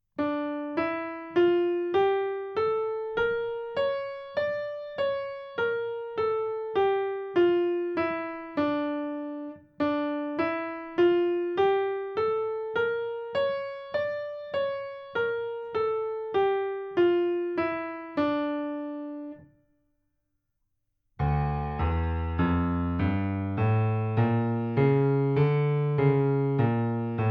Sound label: Harmonic D Minor Scale Exercise